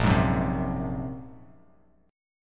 A sharp 7th Suspended 2nd Sharp 5th, Bass Standard; A#7sus2#5 strummed; JGuitar